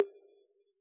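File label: analog conga high; AnlgKit1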